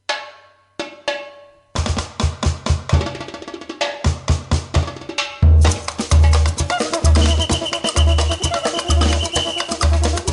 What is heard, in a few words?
batucatoto